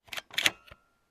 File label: Camera Shutter Sound